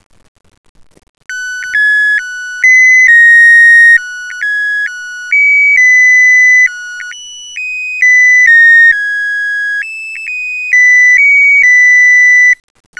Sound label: birthday melody